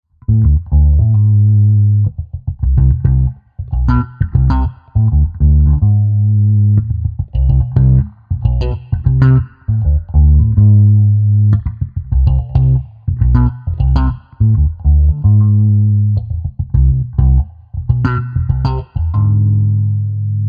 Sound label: Fender Caliber